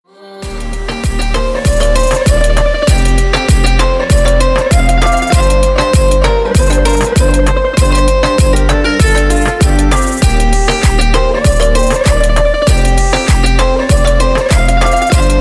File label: soft moombahton